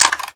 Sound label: assault rifle clip out; tf2classic